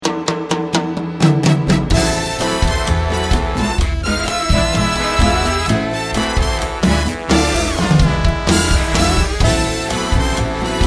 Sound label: Cha-Cha-Cha